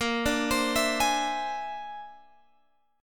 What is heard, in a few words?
Bb 9th Flat 5th Bb down Guitar Open G